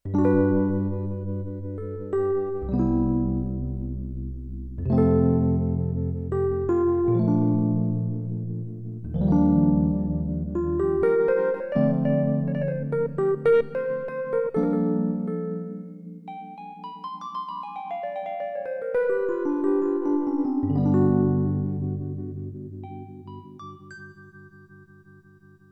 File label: Soft EPicenter, Roland VC-1